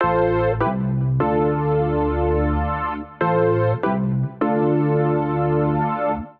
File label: Keys (Dm) 150bpm; Music Loops; Talk 2 Me Keys; Ableton; Factory Packs; Singularities